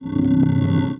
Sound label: chess check; game; Nokia N900